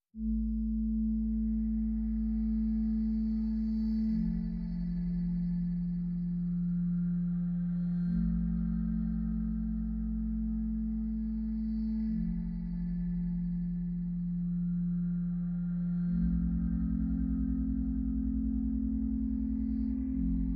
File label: Ambient Mix